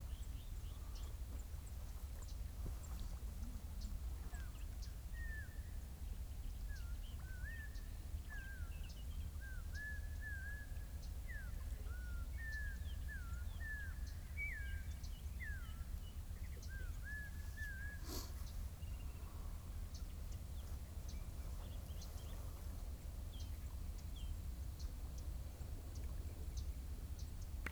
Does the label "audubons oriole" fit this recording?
Yes